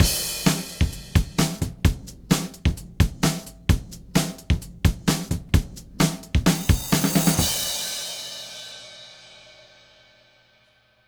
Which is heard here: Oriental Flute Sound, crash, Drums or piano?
Drums